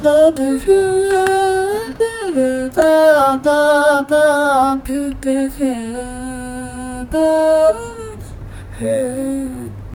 person humming and singin, gibberish

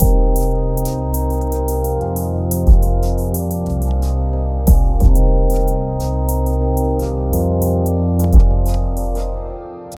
playful mellow calm leisure

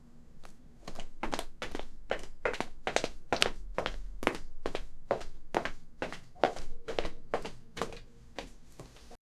footsteps running away, running

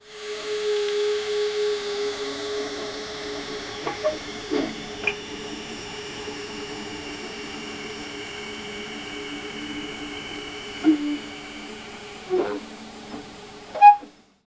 realistic indoor sounds b, baby crying

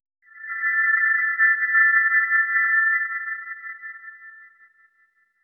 soft radiant bloom warm

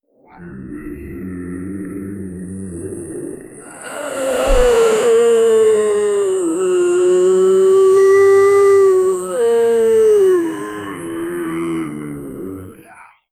many zombies are roaring

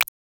japanese visual novel ui, click

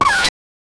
glitch

